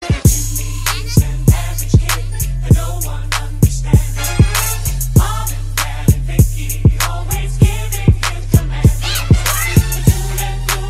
trap; Fairly trap parents

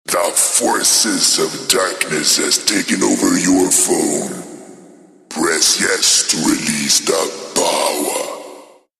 CrEEpy

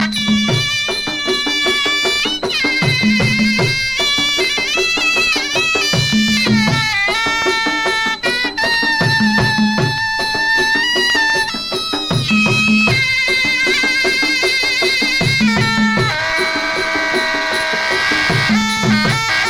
Muay Thai

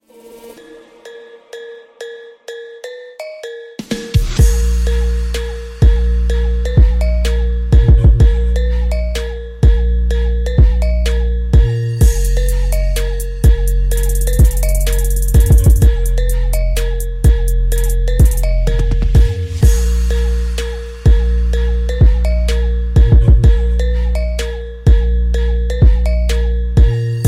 Trap

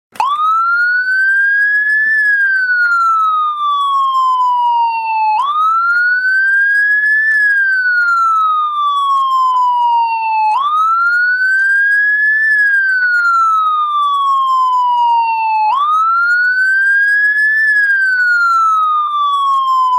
Police Siren

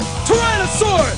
Morph; Mmpr

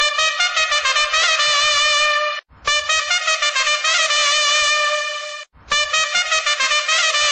Spain Trumpets

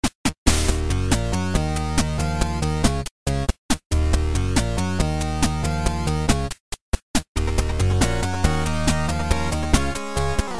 8 Bit

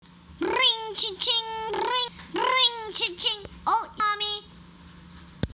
Brrringchaching

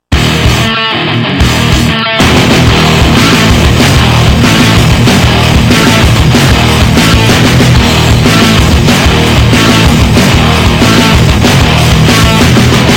Ending; Deathnote

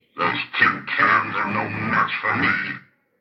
Tin Cans

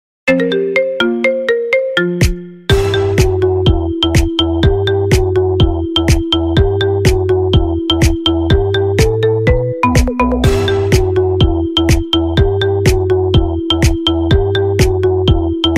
iPhone 6 - Manhattan

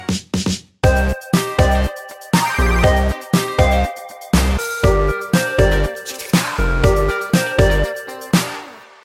Stretching Music

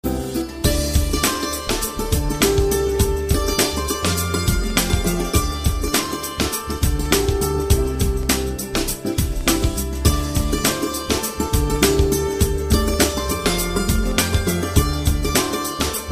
Santoor

